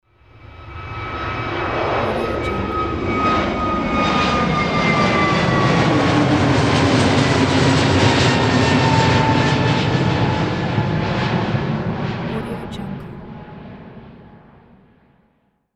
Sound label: takeoff